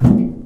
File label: metal box footstep; physics; Garry's Mod; hl2